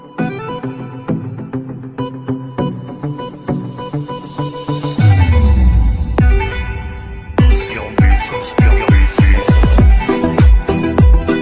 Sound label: top trending incoming call tone ringtone